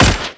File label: mp5 fire, weapons